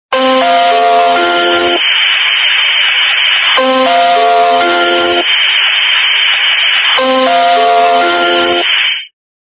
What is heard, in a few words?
interval signal; ruv ras1